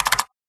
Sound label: enter; 一度日本語確定してからのEnter押下の効果音。; シンプル 動作 日常 生活; Enterキーを押す音